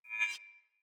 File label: Long Sword Whoosh Accent; Weapons; Pothia